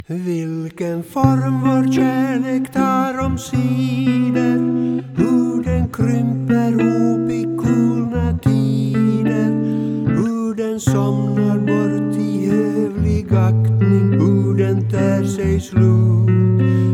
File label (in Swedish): tenor